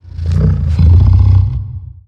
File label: Low Growl; Rampage Ralph